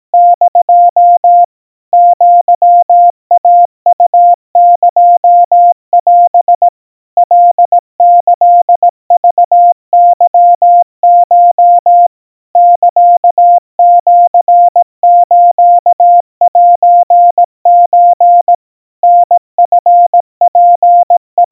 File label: aiueo 60 cpm 700 Hz Crispy, Morse